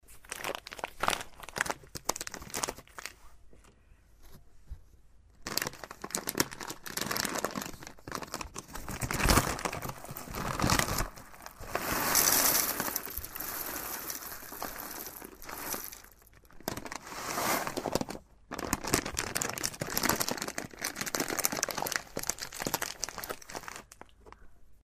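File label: coffee bean sounds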